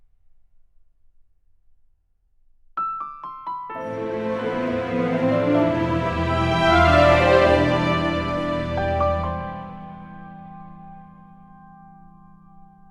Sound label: Orchestral No Drums